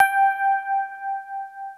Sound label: E piano G4; Greytsounds VOL-1